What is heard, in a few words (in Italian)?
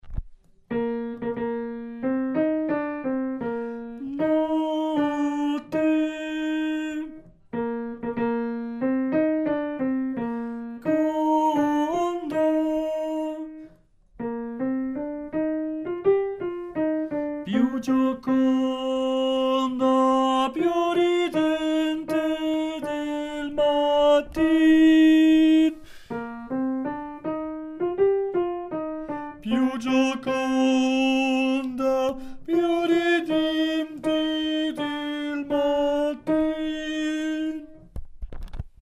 bassi; o felice ii